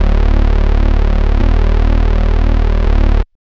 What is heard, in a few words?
Stick Bass, Drum n Bass; KJ Sawka; Drum n Bass & Beyond